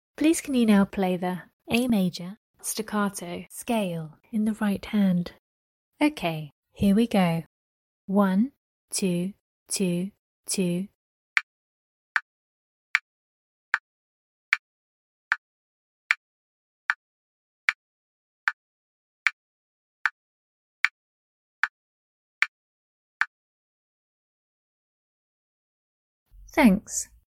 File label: a maj stac scale rh